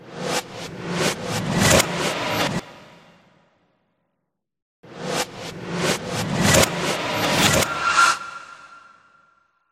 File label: Room Transition; Silent Hill Downpour